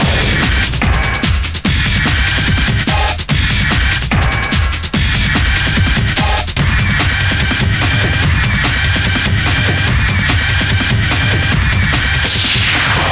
rave

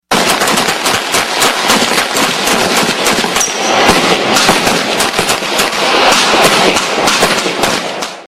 gunshots

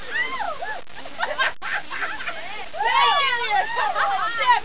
Party laughter

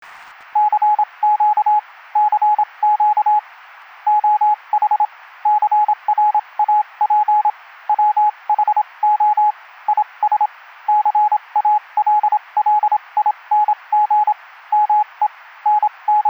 morse clean

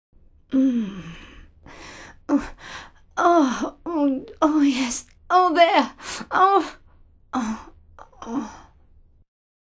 old vocals

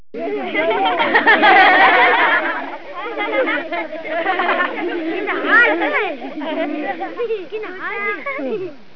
kid laugh

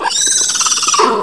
big horse

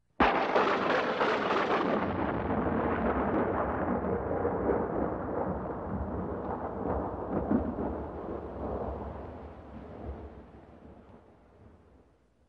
Scary Sound - Lightning Bolt

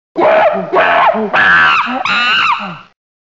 Chimpanzee sound effect

